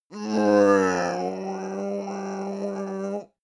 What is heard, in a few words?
male grumble cranky; menschen; koerpergeraeusche; soundpool